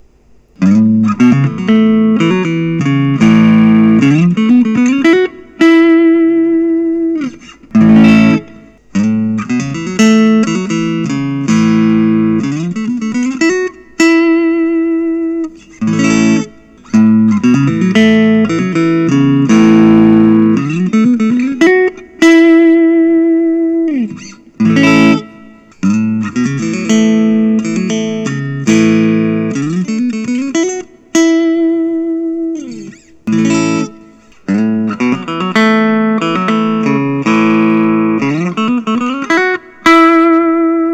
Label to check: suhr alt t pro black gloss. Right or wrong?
Right